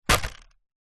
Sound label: vehicles motorcycle helmet set down hard visor vibrate; zapsplat